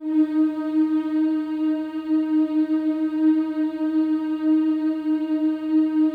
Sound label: D#4, CHOIR; Spectrasonics; Symphony of Voices